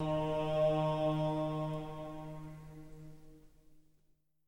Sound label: sounds music; Halo Combat Evolved; Xbox